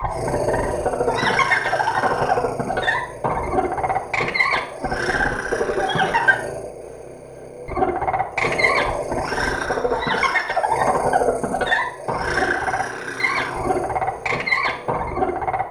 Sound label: Beastling sing loud